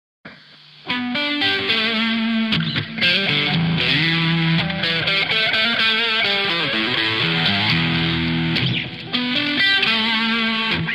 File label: mid, strat; purphaz